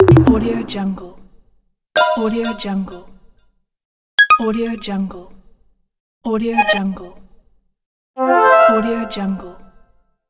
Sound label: arcade games interface fx